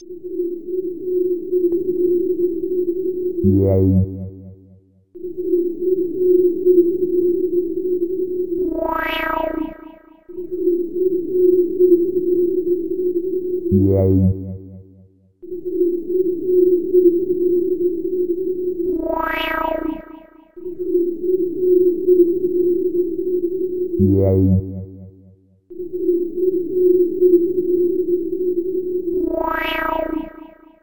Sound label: Silent Pressure; bomberman hero